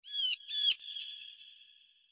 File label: creature